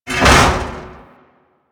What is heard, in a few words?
Mech Head Impact; Nechro